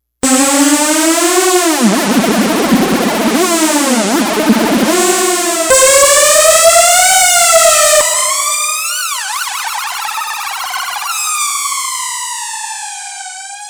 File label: saw